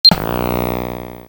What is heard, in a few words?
sfx tv turn on